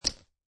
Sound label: Poker Chips Dropping On Playing Cards; Poker, gambling, chip: Poker chips dropping on playing cards